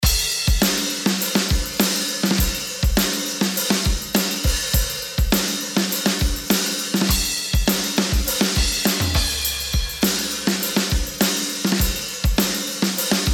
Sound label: drums bus after